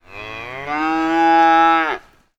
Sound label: cow moo